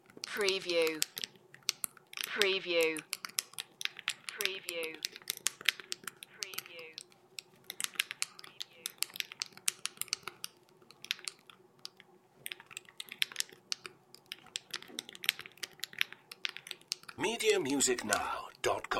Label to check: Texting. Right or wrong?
Right